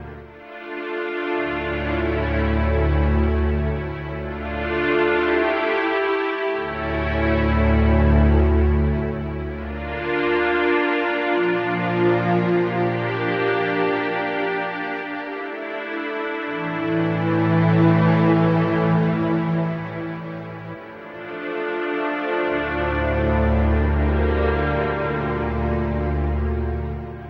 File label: strings, Sad